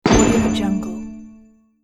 magical explosion sound

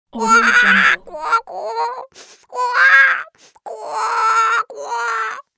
cartoon chicken sad crying

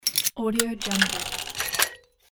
bolt

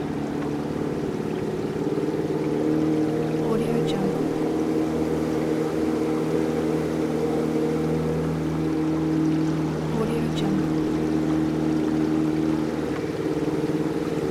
small motor boat

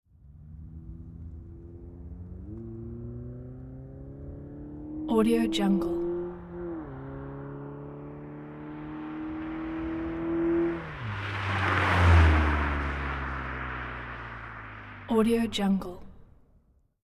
street race car fast approach with tire roll

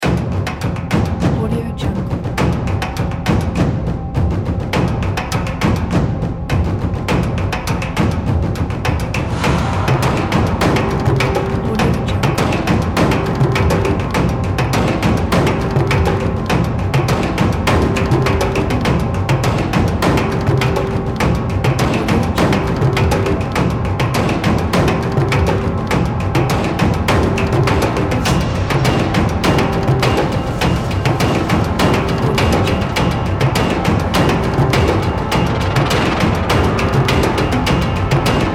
percussive cinematic horror trailer; nhpaudio

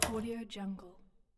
typewriter key single

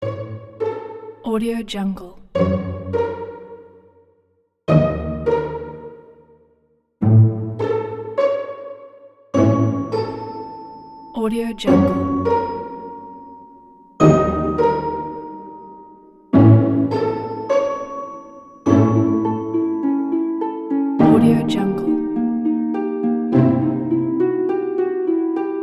cute cuddly childish fun

